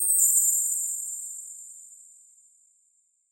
fairy dust; soundscrate

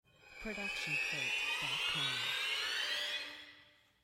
gliss

